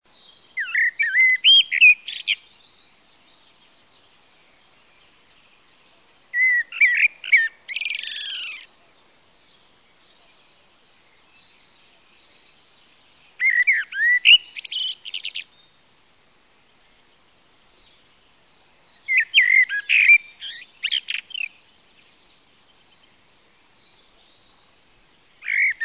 Merle noir, chant

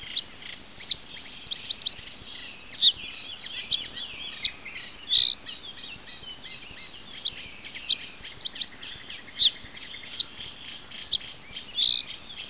bruant roseaux, chant

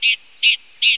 pie bavarde

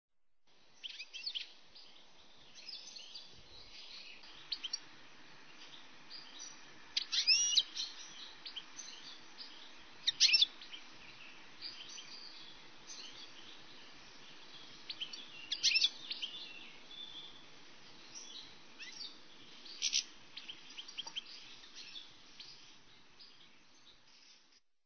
Chardonneret, chant

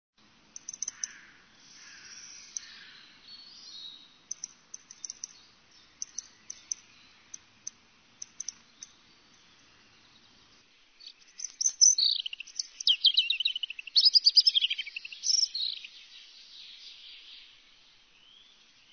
Rouge gorge, chant; cris des oiseaux de france et europe

